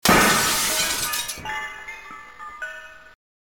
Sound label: break glass